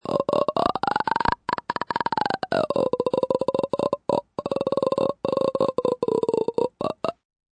Mouth Creaking, Mouth effects, 1 child, long, throat vibration, human voice, kid